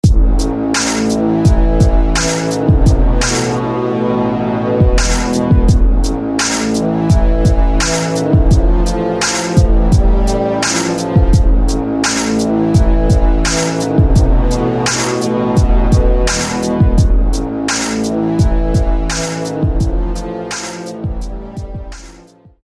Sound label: Horn; Horn of the Gladiator; SFB FX